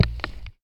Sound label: Chair